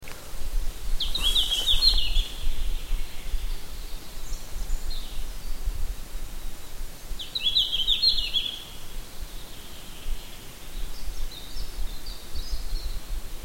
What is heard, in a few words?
tarsiger cyanurus; birdsongs